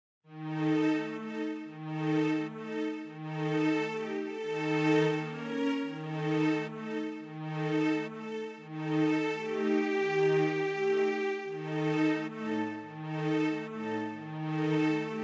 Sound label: bleep